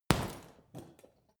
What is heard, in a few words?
boxing punching bag hit hard; boxen